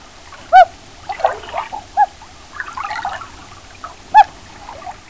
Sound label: loon hoot